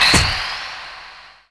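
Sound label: missile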